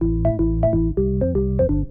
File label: ORGAN HOUSE 125 A; eJay; Keys